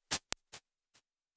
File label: Vox Whispers, Lexicon 480L Reverb; impulse responses; Big Gee's